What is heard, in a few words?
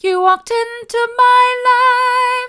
mid, vocal